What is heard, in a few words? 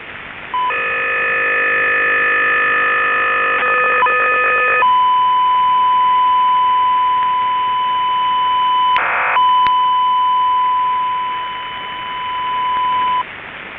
OFDM 48 42 IRS